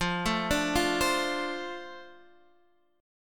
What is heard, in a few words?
F 6th F down Guitar DADGAD